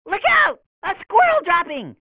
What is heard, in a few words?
squirrel dropping